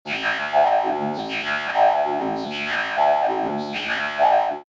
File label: abstract wobble, energie